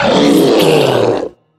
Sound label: troll monster death